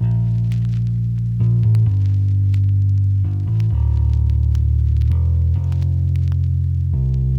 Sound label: Classic Melody Loop - 130 BPM D Min Bass; Melodics; Loops; Loop Stems; Cymatics; Eternity